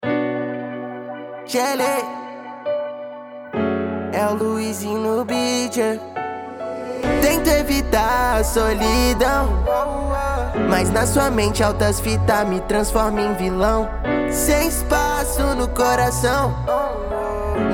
rap trap

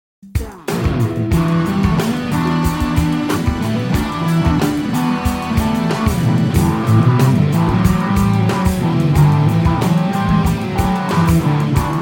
midnight funk vibe instrumental

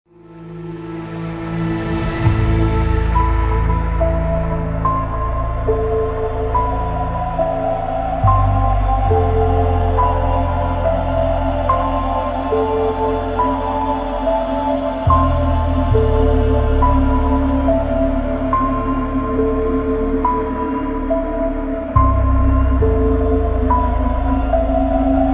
the best free horror music; for your haunted project

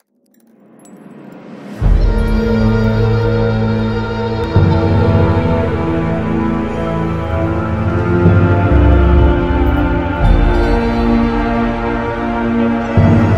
most epic atmospheric orchestral horror

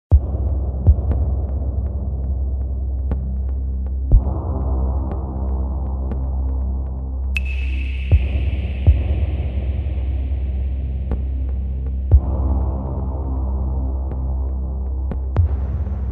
hard rain; zombie night terror ost

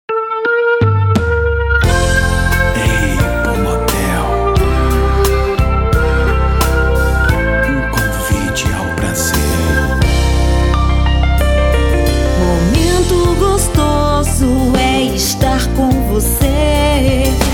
jingle; apple motel; kazam studio

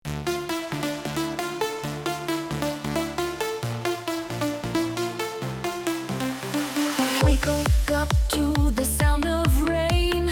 heartbeat thunder

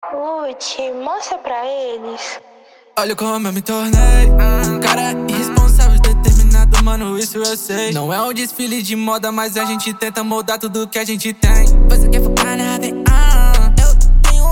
rap trap

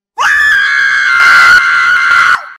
urlo horror effetto sonoro sounds scary horror

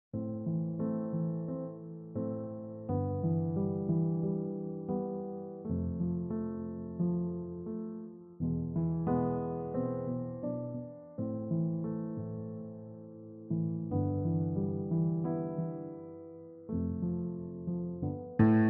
instrumental horror

